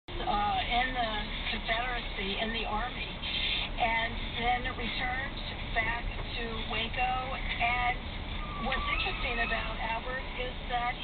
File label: camry 2017 hybrid squeaking noise problem